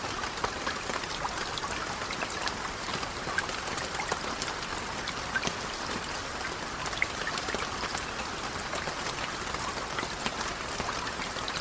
Rain Leaf Grass Light; zps; Ambient

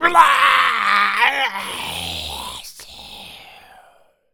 Male Berserk, Zombies; zps; Male1

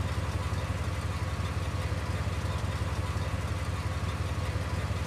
Car idle; zps; Ambient; Vehicles

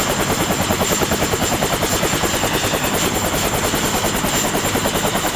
Helicopter Idle; Ambient; zps

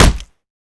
AK47 Melee Hit; zps; Weapons; Firearms

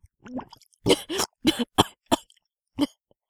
female air rough; zps; Humans

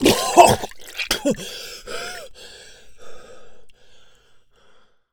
Air Rough, Humans; zps; HM Water

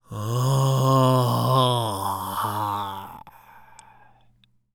Male Taunt, Zombies; zps; Male1

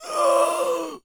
Zombies Male No Use; zps; Male1; No Action

